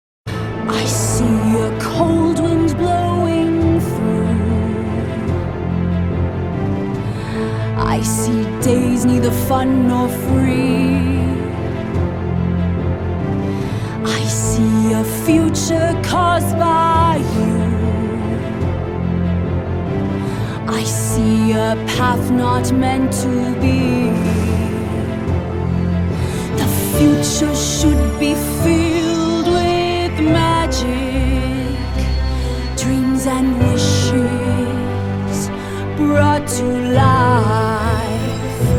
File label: Orchestra Cover + Vocals